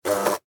Hornet Land Short, Animal, Hornet, Land, Short, Fly, Wings, Buzz, Insect, Bug